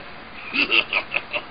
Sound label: aaah